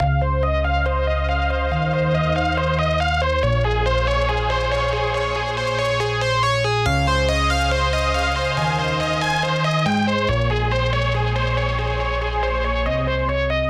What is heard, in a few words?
lead highest; octane